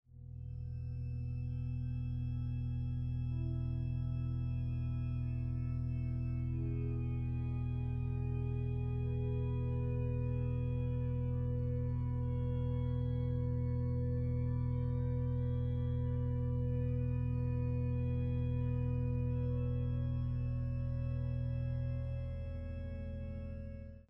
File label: aeoline